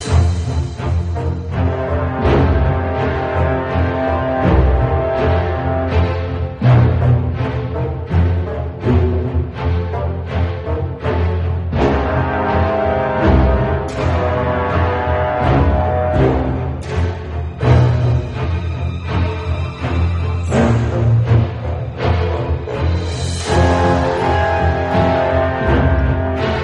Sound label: Menu Music; The Forge